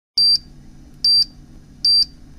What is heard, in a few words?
Three Beeps